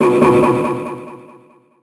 death; Dullahand

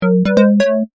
Correct; Kahoot

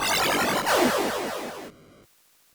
Player Die; Abadox; NES

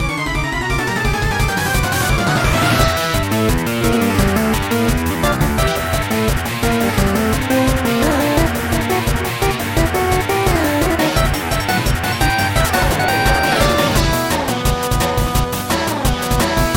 Trainer Battle; Pokemon FireRed and LeafGreen Remastered; gba

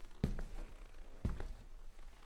SFX Footstep Wood; this is the zodiac speaking 2020 gamerip switch ps4 xbox one pc macos

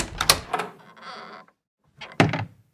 wooden door open; Devour

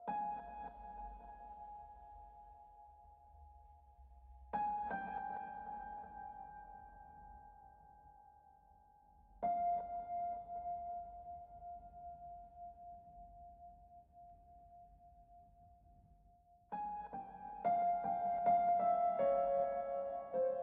Explore Piano Calm Stem; Necropolis

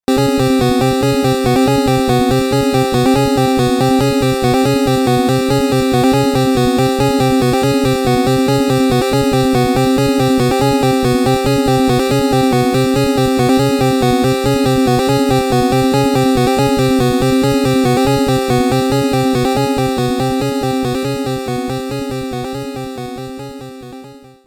Horror!!; Uninvited

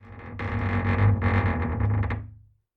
random wood creak

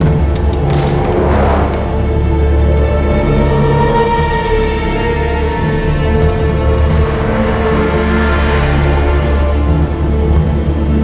Siege Field Melody; Ninjago Monastery; lego universe macos windows gamerip 2010

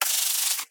Spider Pain; The Inn

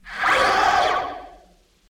robot death

